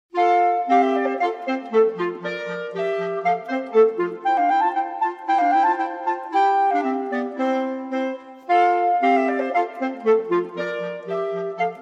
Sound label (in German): 3 klarinetten; hofmeister verlag